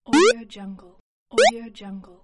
8 bit jump

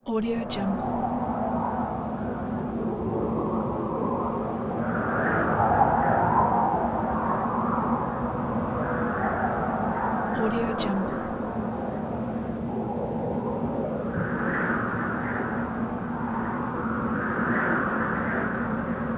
desert wind

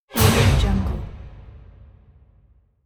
sharp symphonic hit

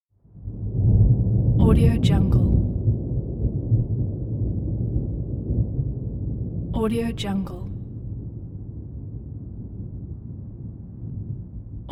deep thunder

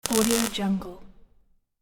mig welder sparks intense zap; hollywoodedge

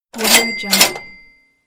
cash register

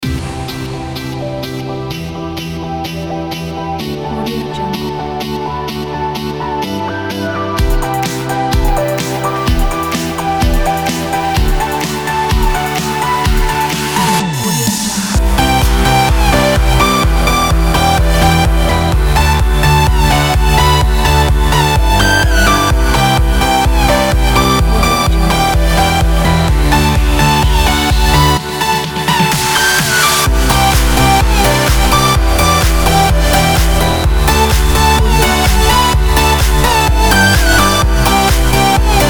edm

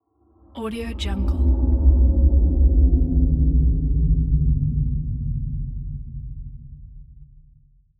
distant spaceship landing

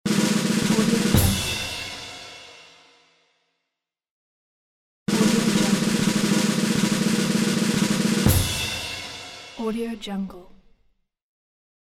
drum roll

